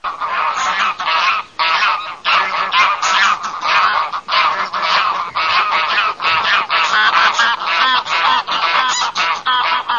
OCA SELVATICA GRUPPO

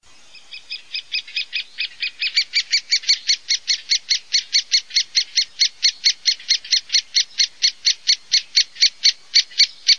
PICCHIO ROSSO, birdsound

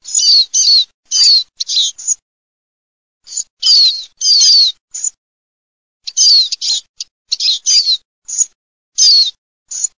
lucarino

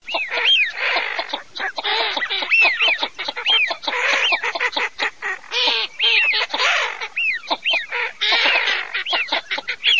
FISCHIONE MESTOLONE

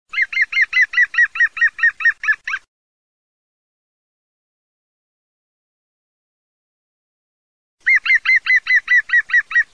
PICCHIO VERDE, birdsound

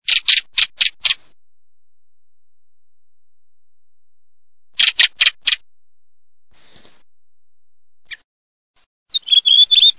SASSELLO

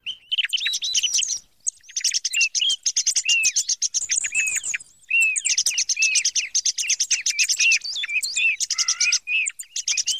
tordo

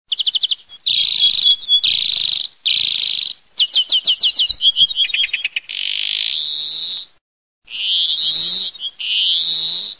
VERDONE ORTOLANO

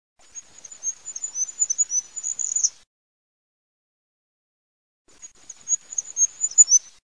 REGOLO

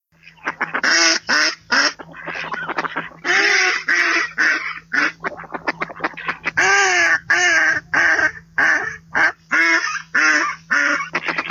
GERMANO

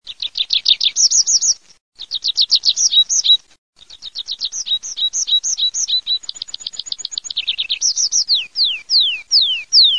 PRISPOLONE

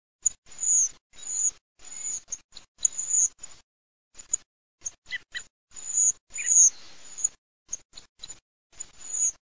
TORDO SASSELLO, birdsound

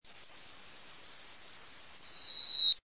ZIGOLO GOLAROSSA

